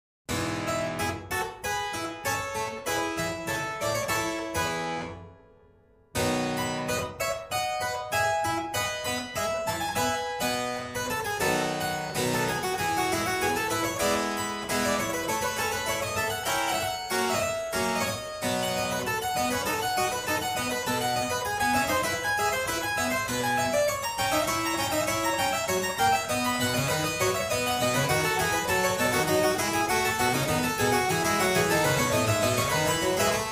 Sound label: italian concert clavesin; BWV971